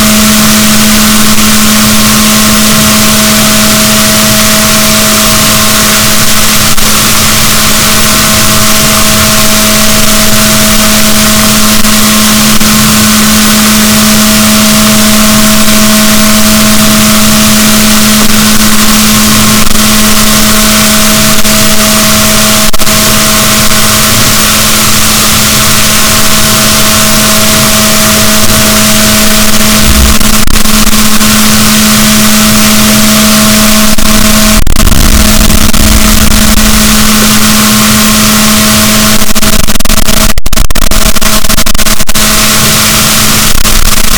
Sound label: rorquals negatif, boat